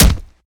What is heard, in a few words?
melee character fist; viper